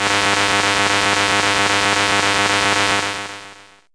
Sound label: Spark, Fairlight CMI IIe